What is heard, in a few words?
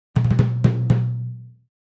Timpani, (Hands), "Battle Finale"